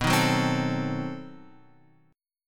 B 7th Flat 9th B, guitar chord; B7b9 Chord {7 6 4 5 X 5} strummed

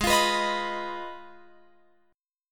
G sharp Minor 6th G sharp down Guitar Open F; G#m6 strummed

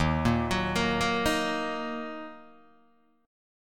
D sharp Minor D sharp down Guitar DADGAD

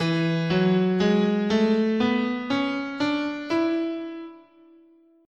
E Mixolydian, guitar, scale

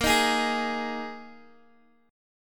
Bb 7th Suspended 4th, Guitar, Open G; x,x,8,8,9,6

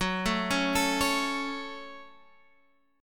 Gb Minor, Guitar, Standard; arpeggiated; JGuitar

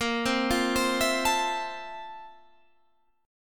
F Major 7th, Bb; Fmaj7/Bb; arpeggiated

